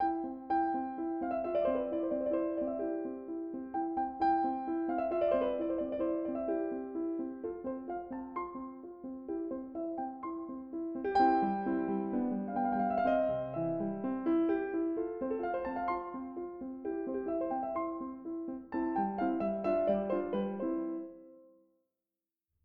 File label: pianoteq